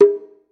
ポンッ

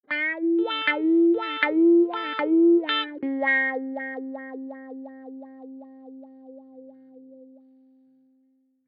ワウギター

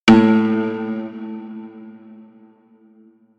三味線効果音

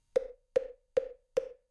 ポク 残響

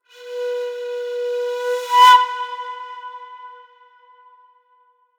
段々強く

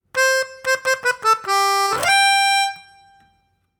ピアニカ単体

